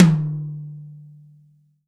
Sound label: TOM, Real Drum Kit